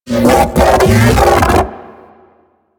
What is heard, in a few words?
Mech Ambient Vocal; Warframe; DE; Vehicles